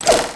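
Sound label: hand grenade; quake2; railz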